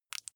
flesh wet greasy squish small

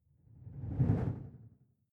whoosh simple flutter phase low

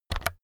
keyboard external key press fast

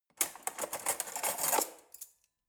cutlery forks drawer grab soft

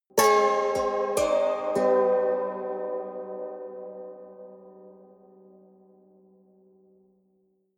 haunted strings sequence hard

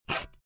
saw use short

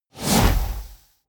whoosh

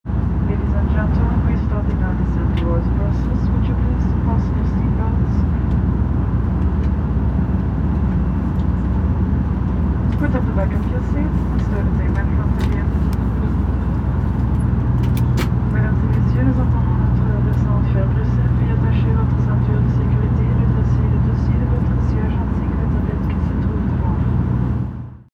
turboprop cabin

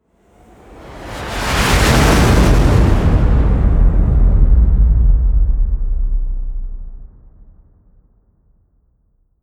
flyby

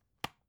dvd case close

